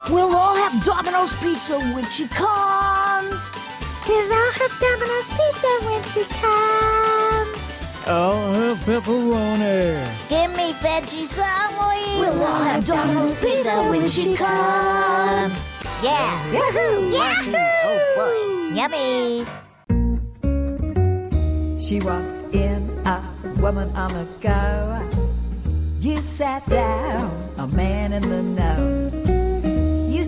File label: Songs